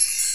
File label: TELEPORT